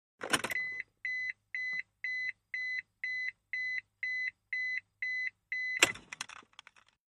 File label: Montero Key Beeps, 1998 mitsubishi montero int.